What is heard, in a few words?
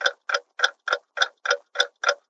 clock